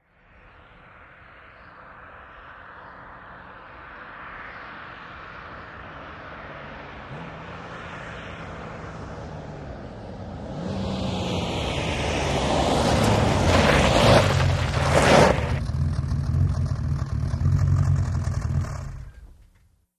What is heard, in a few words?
VEHICLES ASTON MARTIN: EXT: Approach, fast, stop, some gravel, switch off.